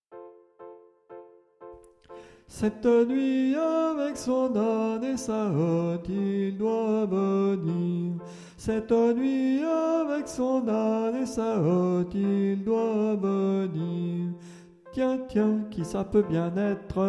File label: soprano